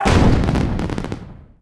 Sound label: Firecracker Explode; Sid Meier's Civilization 4 Complete; Warlords; Units